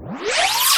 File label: Sweeps Short